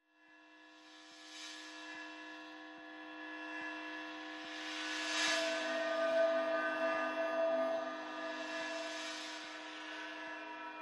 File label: Pads Only